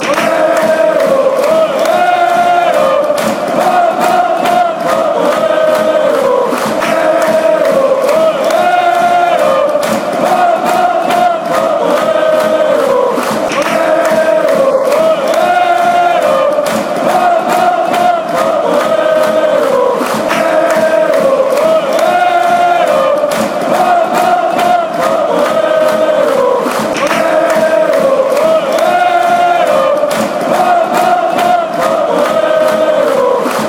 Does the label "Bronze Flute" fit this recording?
No